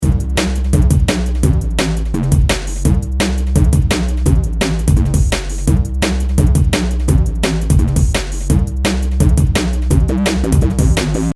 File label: Tekstep, Bass; EXS24; EXS24 Instruments; logic